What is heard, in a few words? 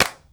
CLAPS; CLAPS+SNIPS